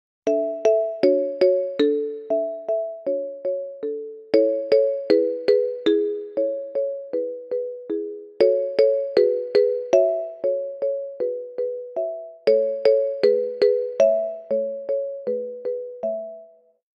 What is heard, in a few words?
Truecaller Ringtone